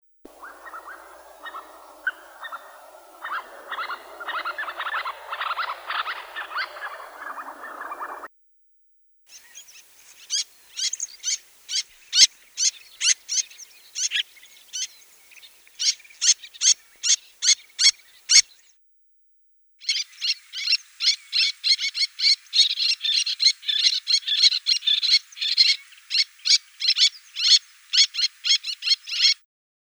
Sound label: Sternula albifrons